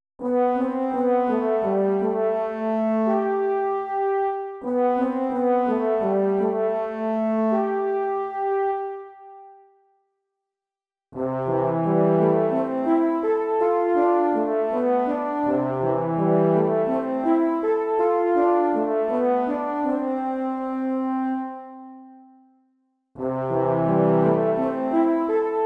Summer Place Pad

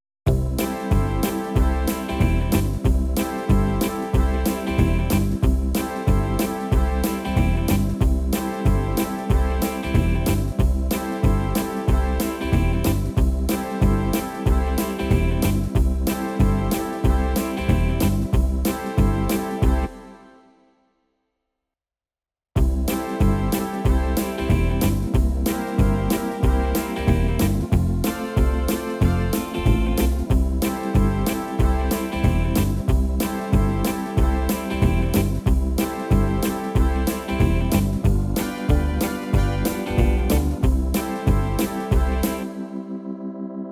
Multi Pad

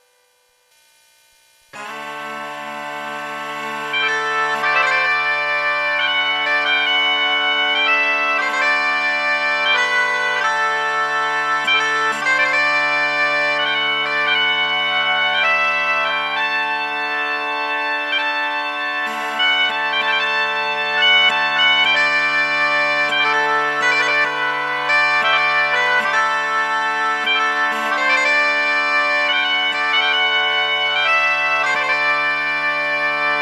Bagpipes

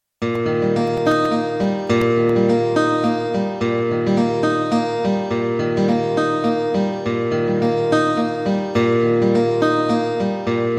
Rising Sun Arpeggio

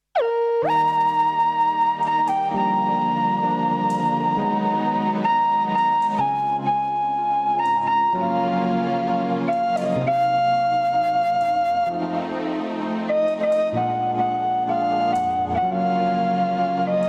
Portamento

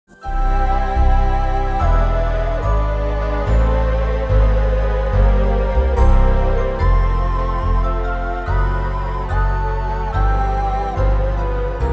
Portamento

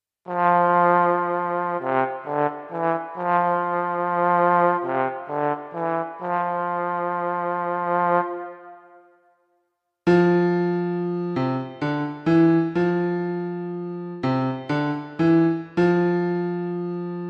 Trombone & Piano & VIBRATO

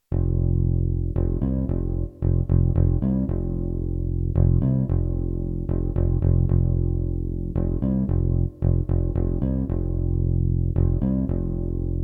World Bass Part

